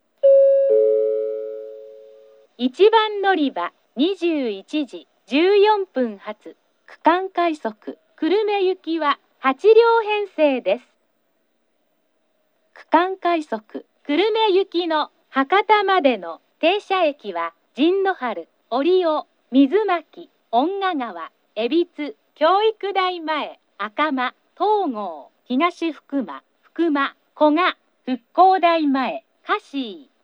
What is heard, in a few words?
kurosaki regional rapid kurume; 1番のりば案内放送（区間快速 久留米行き）; →かつて聞けた停車駅放送。「陣原」を用いて、博多まで停車駅を放送していました。